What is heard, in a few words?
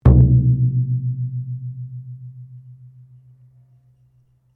Bodran, Irish styled flat drum; Drums; Musical